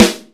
snoring, snares real

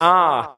"ah"; ooh ahh

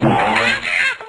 bonk yahoo yahoo

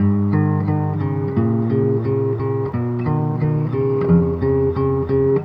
guitar, Jazzmatazz Loops

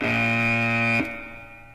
buzzed; Zaps and Beeps

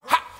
"ha", Voice

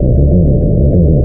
rubbery bassline; Loops; Electronic

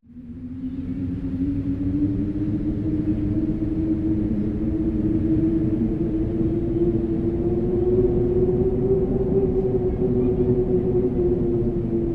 windstorm, Rumbles

